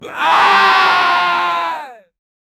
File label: short battle cry